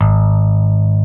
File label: ELECT BASSE, FINGER BS